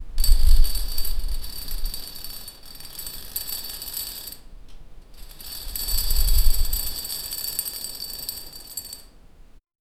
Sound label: curtain rustling; fabric